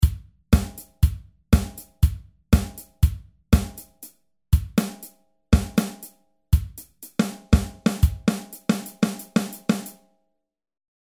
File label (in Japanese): ドラム